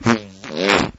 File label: fart medium